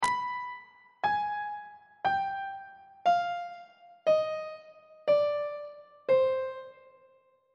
b sharp harmonic minor scale descending on treble clef